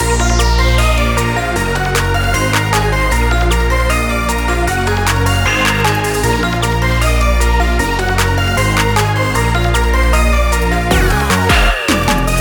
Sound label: Robot loop; PeriTune Material